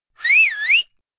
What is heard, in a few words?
whistling